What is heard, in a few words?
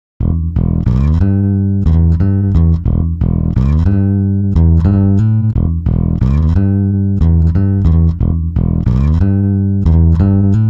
bass; timestretch